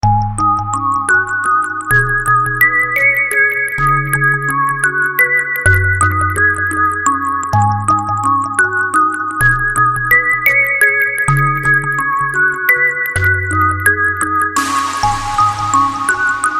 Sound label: Retro